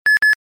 Beep

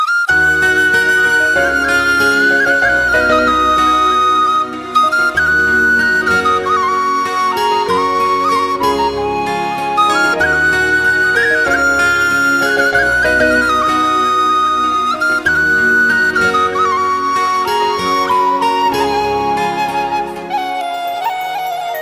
Romantic Song

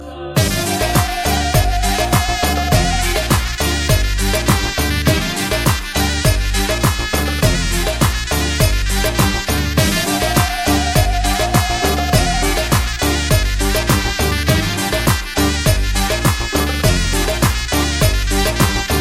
Balkan beat

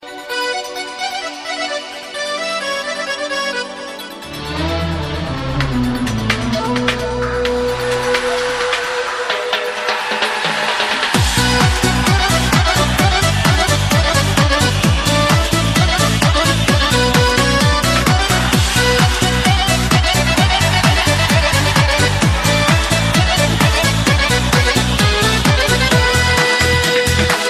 Serbia Hit

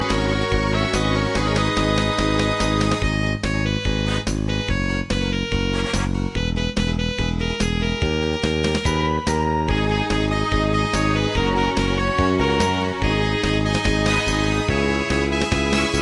Midi; Bioman

